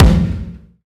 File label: Acoustic Drums - Kick; Heavy Acoustic Drums; Antidote Advent